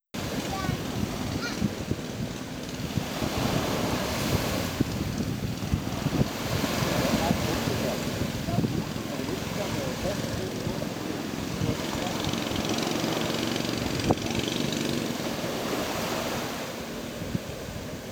Rocky Beach, Koh Tao

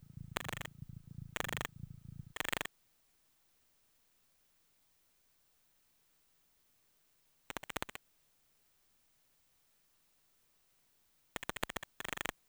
030bpm; elektron machinedrum

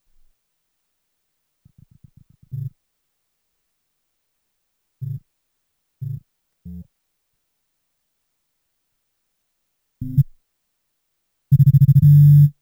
030bpm; elektron machinedrum

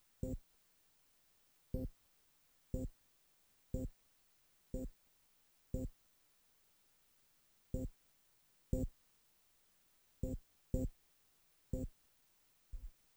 030bpm; elektron machinedrum

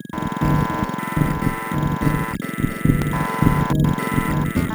105bpm; elektron machinedrum

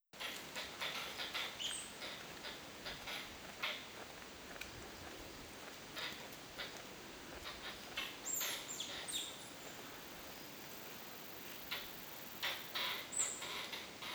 Mango Bay; Koh Tao

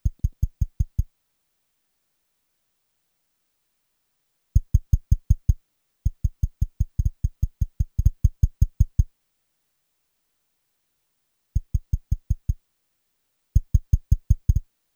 030bpm; elektron machinedrum

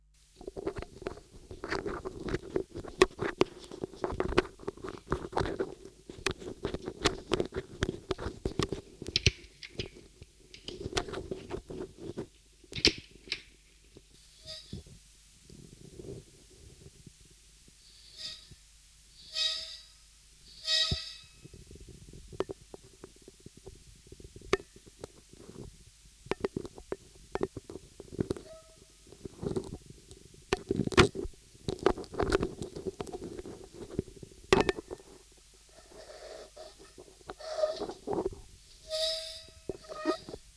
oton cuts; techno 2000

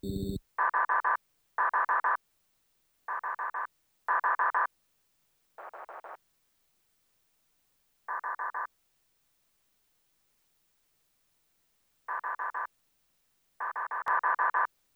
030bpm; elektron machinedrum

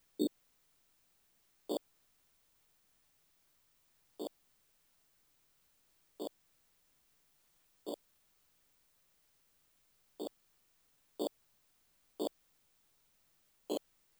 030bpm; elektron machinedrum

